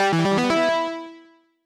Liberation Fanfare; Rebel; tonight we riot

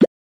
Suck Pop Message

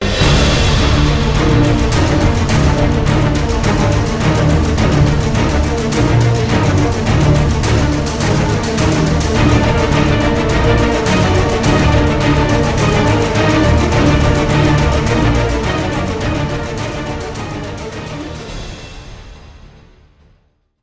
time out losing

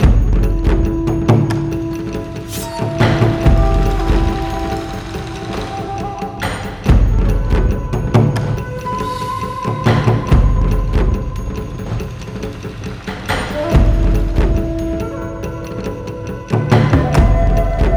Fight; Age of Wushu; Windows

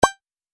Pop Up Ding

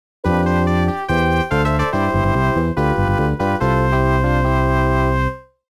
Name Register Finished; 2nd Place or Below; Ghouls 'n Ghosts 'n Goblins; arcade

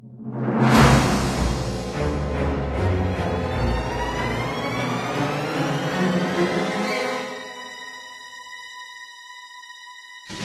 sting; The LEGO Ninjago Movie Video Game; PS4; Windows; Xbox One

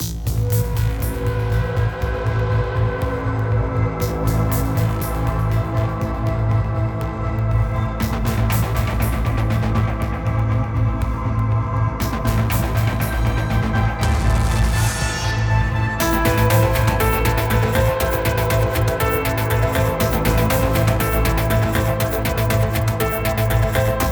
Cinematic Ambient Underscore; blood waves

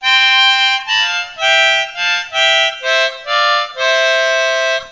Harmonica; kentei kyoukai imasara hito ni wa kikenai otona no joushiki ryoku training ds

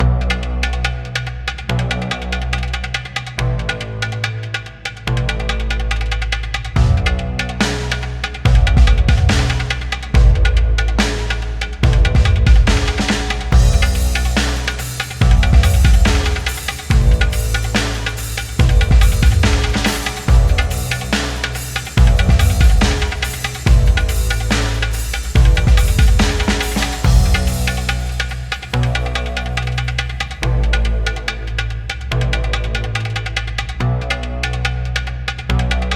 music level

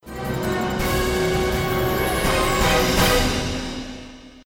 Battle Stinger Wave Failed; Zak Storm Super Pirate; mobile

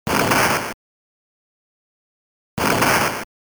Explosion of Enemy Aircraft - Aerial

